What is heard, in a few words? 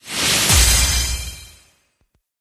Pack Flip; Epic